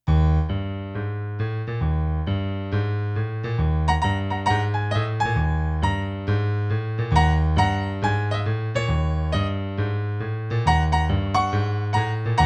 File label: Eb blues